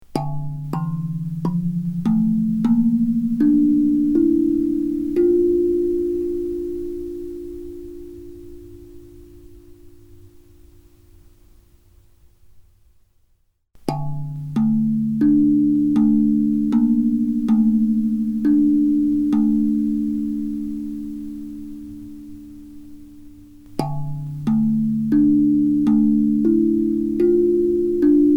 Hapi Scale D Ake Bono

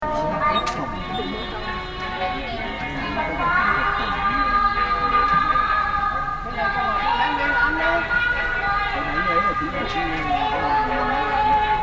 PA music

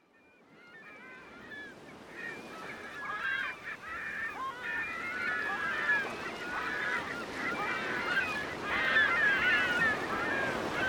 Seaside Ambiance

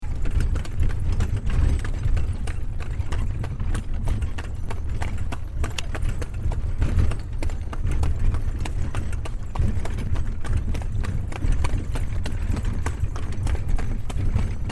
bagan burma horse cart ride; Quiet American; aaron ximm

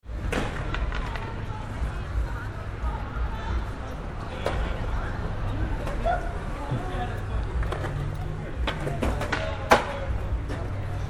Skateboarders

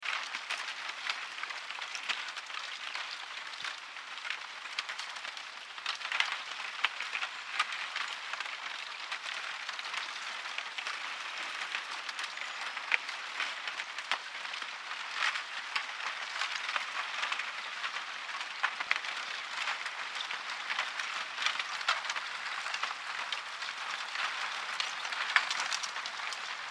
Lake Superior Ice Breakup

